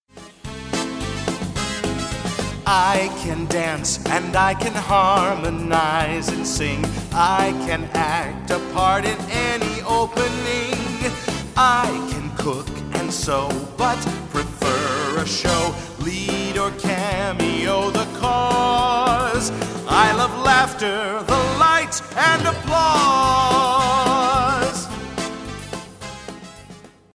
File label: laughter and applause